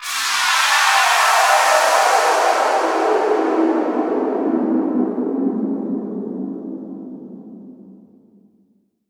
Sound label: FX Downlifter